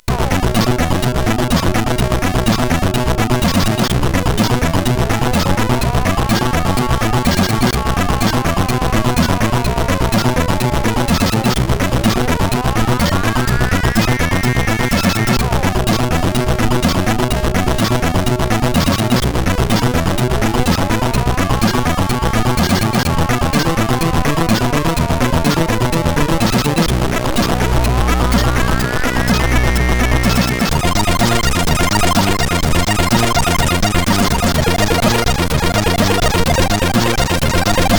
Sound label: Flesk, CSG8580R5; hvsc